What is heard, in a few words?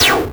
laser, weapons